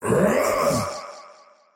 attack big; dota; faceless void

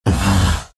pain, vo; arc warden

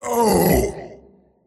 pain; tiny

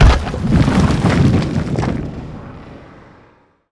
burrow in; weapons; dota; nyx

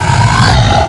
boar death; deaths; beastmaster

